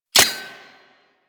Pistol Mech; Warframe; Tenno; Guandao

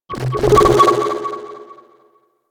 Excavation Scanner Pulse; Missions

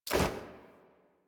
Generic Weapon Light Aim Transition Out; Warframe; Weapons

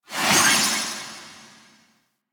Alchemist Transmute Ability Loop End; Warframe; DE; Warframes

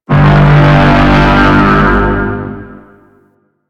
Turret Detect; Warframe; Duviri; Duviri Turrets

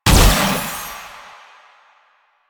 Kunai Proj Explosion; Warframe; Weapons; Choir

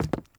Mockery Walk Wood, footsteps; Characters; Enemy; Mockeries

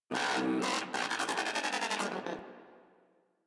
Moa Aggressive Vocal Cold Shiver; Warframe

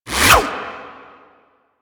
Baton Whoosh; Warframe; Lasrian; Lasrian Soldiers; Weapons

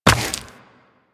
Run Rock, Footsteps; DE; Warframe; Tenno

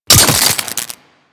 Head Shot Metal, Bullet Impacts; Warframe; Weapons

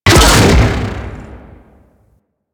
Drop Ship Explosion; Ambience; Warframe; Infested; Golem Trial

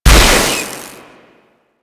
Purification Weakpoint Destroy; Warframe; Nineteeen Ninety Nine; Infested Survival

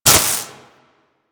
Plasma Grenade Hit B; Warframe; Weapons; Hit Effects

